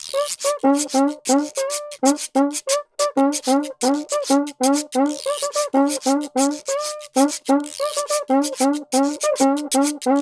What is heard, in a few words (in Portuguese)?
samba 94 cuica reco reco; gen 04 stems samba baiao